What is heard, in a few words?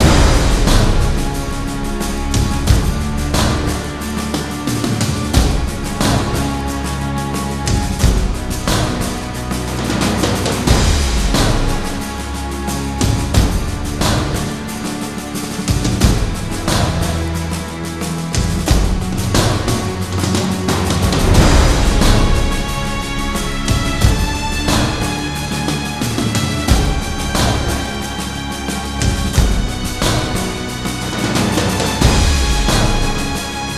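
drums strings loop; transformers war for cybertron gamerip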